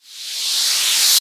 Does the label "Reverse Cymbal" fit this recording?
Yes